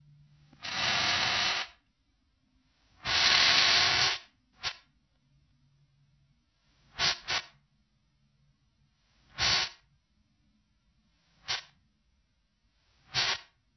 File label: Steam Vent